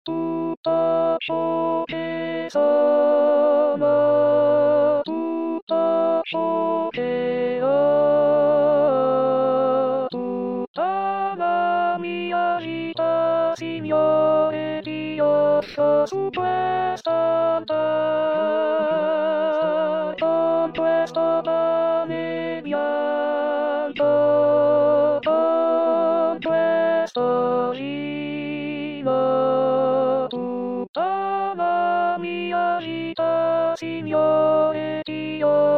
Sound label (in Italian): 4 voci contralto